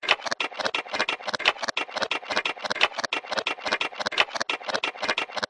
science fiction steampunk mechanism metal small clicks mechanical operate; zapsplat